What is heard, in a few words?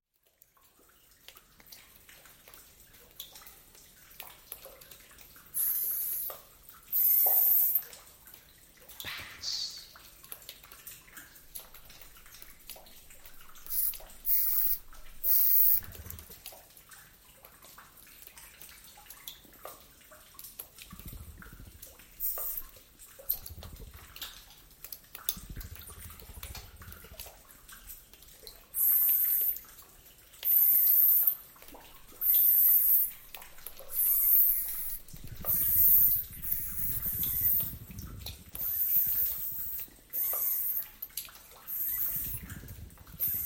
Bats in cave, 3D binaural; ASMR